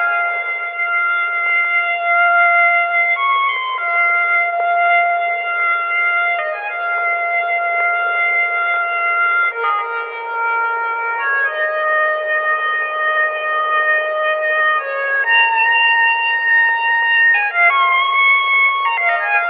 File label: horn in the wind; Loops; Electronic